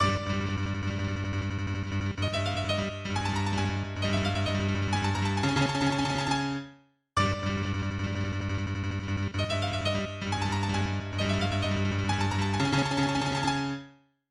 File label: Scurry Music